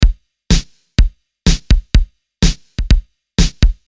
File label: Beat Dissected Chicago